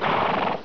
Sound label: horse